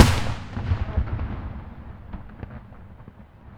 FIREKICK, AMBIENT DRMS; Spectrasonic Distorted Reality 1

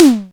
DRUMTRAKS, classic machines

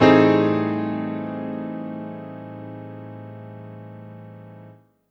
Piano stack (A) Jazz; Single Shots; Misc; Sonic Foundry (Sony Creative Software); Ugly Remnants VOL-2

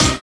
Synths Root C

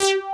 DNC SYN BS; Pro-Rec - Synthsonic

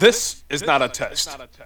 VOX GENERAL; AMG Megabass REMIX! VOL-2

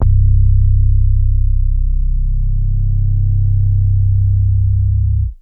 Big Synth Basses

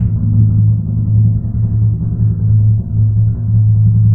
TMP A#, Synclavier Percussion

